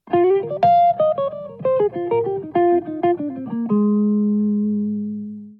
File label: Riff